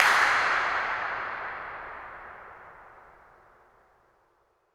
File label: incinerator dirac